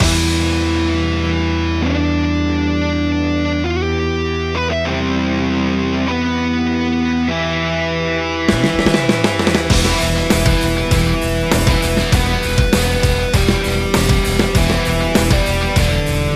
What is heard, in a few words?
RealStrat